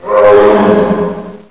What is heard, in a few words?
Roar